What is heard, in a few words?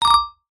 multimedia alert bright notify; zapsplat